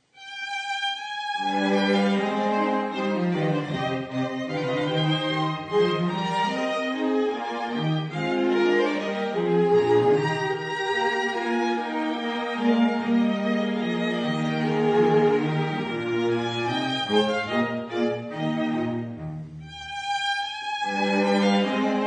Viola Quintet in c, Menuetto in canone Trio in canone al rovescio